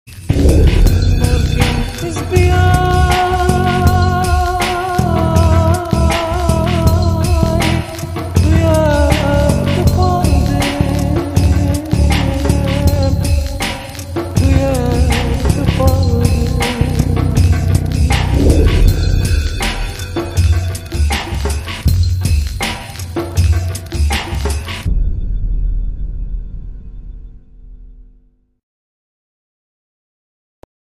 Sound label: Kurdish Soul